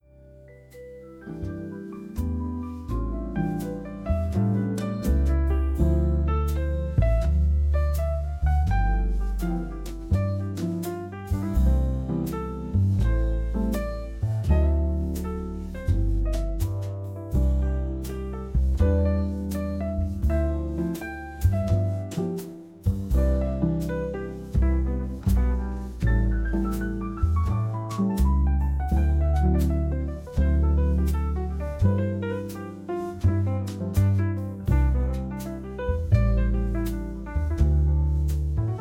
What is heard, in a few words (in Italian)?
Jazz Rilassante, Blues